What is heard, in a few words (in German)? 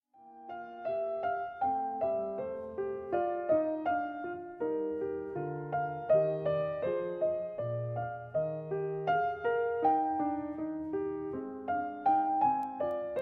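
Variation 21 Canone Alla Settima für 1 Clavier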